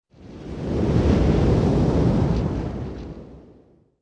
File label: wind buffet; modern warfare 2; amb elements